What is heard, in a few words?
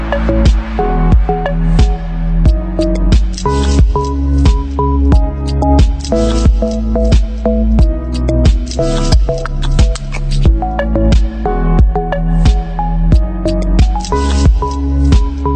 Melody Tone